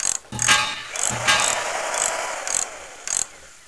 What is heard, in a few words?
building sound